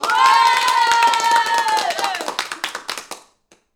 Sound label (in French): Cheer Cris et applaudissements d ados